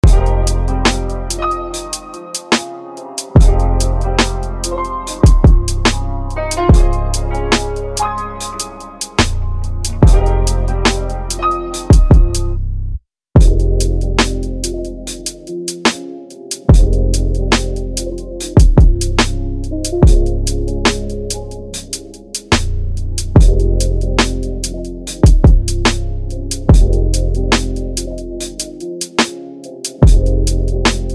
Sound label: Instrumental